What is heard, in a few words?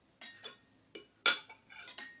ambience room butchery working layer